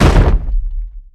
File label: wood deep impact; physics